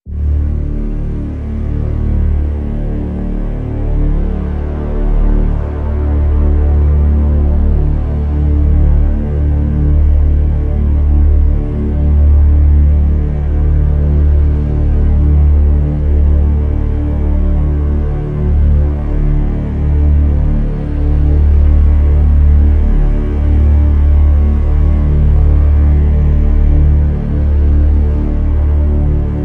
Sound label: Drn Ground Zero D